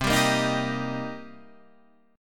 Eb Major C; strummed; Eb/C

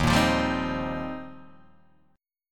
Eb 6th Add 9th, Guitar, Open D

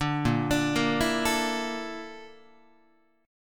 A sharp Major Sharp 11th, Guitar; A#M#11; arpeggiated; JGuitar

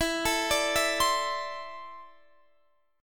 E 7th Suspended 4th Sharp 5th, Guitar, Open D

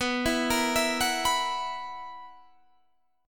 B Major 7th Suspended 4th B

